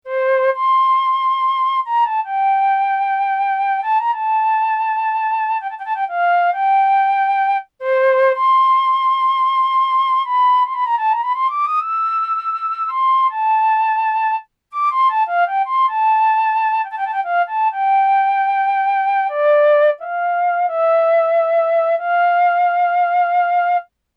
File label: flute